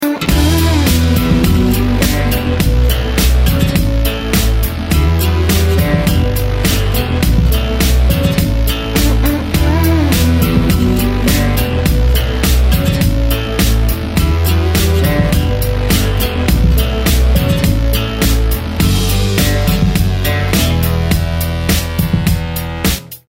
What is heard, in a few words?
Erase Rewind; Hi Fi Realtones